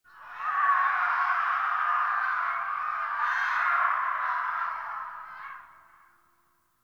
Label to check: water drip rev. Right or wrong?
Wrong